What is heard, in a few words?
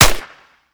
sr3 shoot, weapon; metrocraft